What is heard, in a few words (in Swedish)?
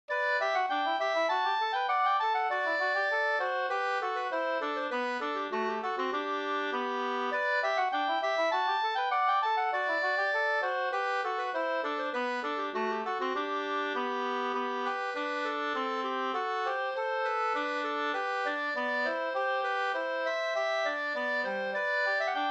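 slangpolska, two-part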